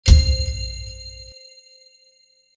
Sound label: freespin end; Party Night